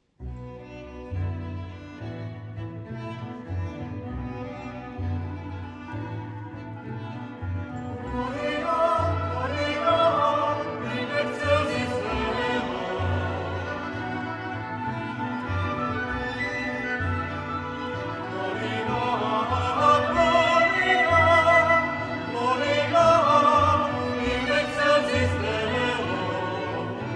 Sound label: hled male jezulatko; Gloria - Usnula, usnula ja Maria v ráji